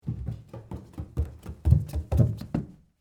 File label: Stairs Down Wood, Footsteps, Stairs, Down, Hard, Wood, Barefoot, Child, Fast, Pace